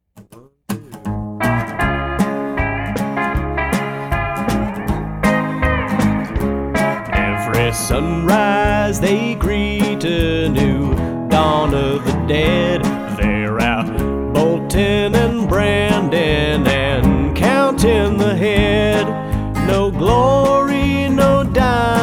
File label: theme song; zombie ranch